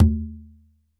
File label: Drums Percussion Congas